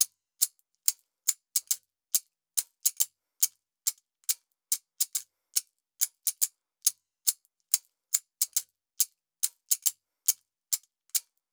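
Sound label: shakers A 070, Hip Hop India; 070bpm; Hip Hop India Modern Percussion; Apple Loops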